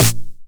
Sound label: 909 SD Tape Sat